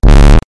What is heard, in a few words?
Bass Bossted Effect